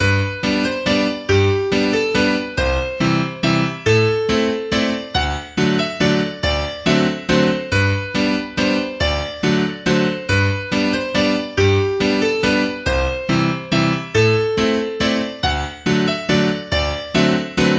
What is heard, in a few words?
Waltz; Mudgee Waltz